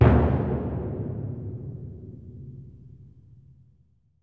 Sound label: Concert Bass Drum; Percussion; Cymatics - Infinity Beta Pack 2.0; Zeus Percussion Collection